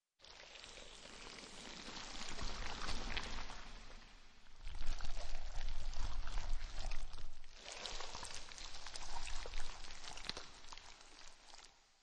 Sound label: Alien Egg Opens